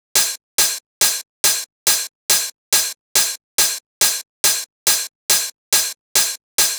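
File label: drum Loop 140BPM overhead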